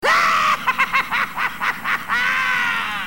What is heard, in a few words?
joker is laughing